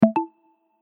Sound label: join, Online Buzzer